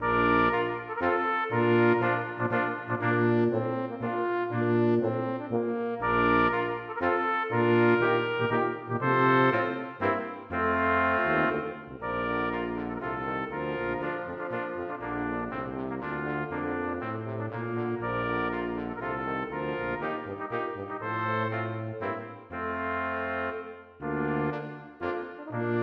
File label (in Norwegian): Menuet for messingkvartett m Baritone og Tuba; Aamodt